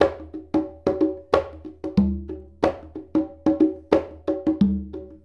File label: Congas; Essential Percussion